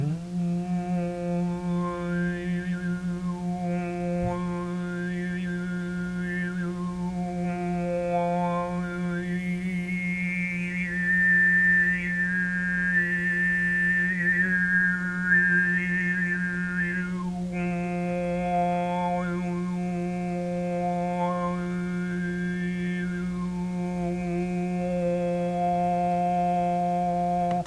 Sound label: Crystal Overtones